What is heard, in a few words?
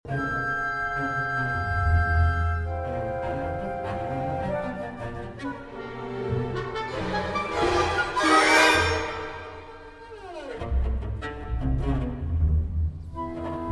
Cello Concerto, Scherzo